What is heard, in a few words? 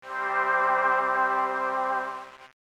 Tune Full Charge